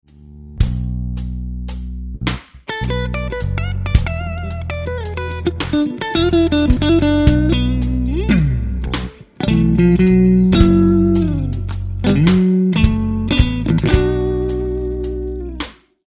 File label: Lick, guitar; Slow Minor Lick 10; Minor Blues licks